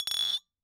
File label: Bottle Struck